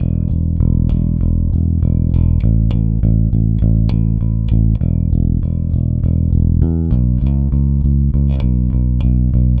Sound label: Rock, Bass; Bass X